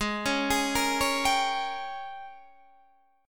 Ab Major 7th Suspended 2nd Suspended 4th Ab, down, Guitar, Open D